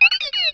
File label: cry; source of the sovereign